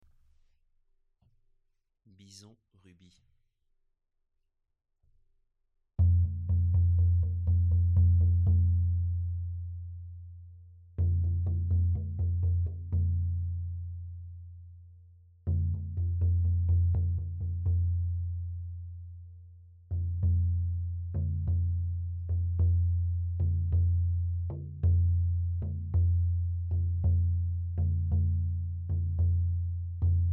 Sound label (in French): bison rubis; bison 45 cm frene rubis zoisite